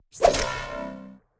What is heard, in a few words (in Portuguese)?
Templo Mumia; super mombo quest